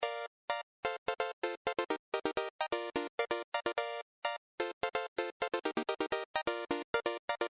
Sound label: BackBeat